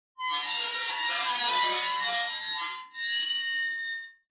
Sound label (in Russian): odin silnyj skrip odnogo dvizhenija; Звук качелей раскачивающихся на ветру, металлических, на цепях или веревочных